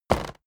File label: step walk wood plank creak